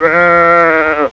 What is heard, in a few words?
SHEEP; CMI Sound Library Rev 1.3